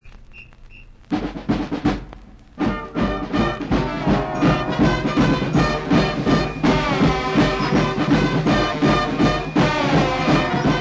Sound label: Rockin the Blues Fanfare